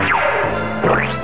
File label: web sound